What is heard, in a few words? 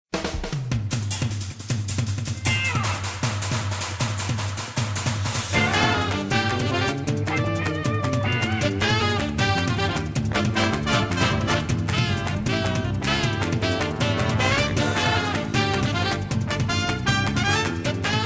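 swing big band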